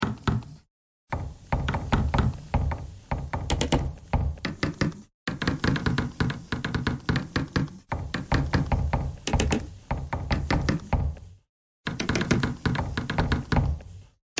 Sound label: bagpipe thread noise